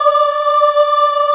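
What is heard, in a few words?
D4 child ah left, Choir; USB Soundscan vol.28; Acoustic & Synth; AKAI; CHILD AHF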